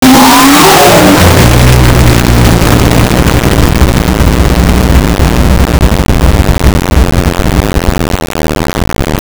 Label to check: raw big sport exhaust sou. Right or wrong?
Right